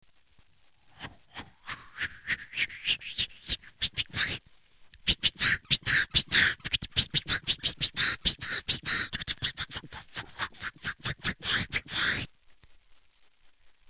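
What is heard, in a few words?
scratch, beatbox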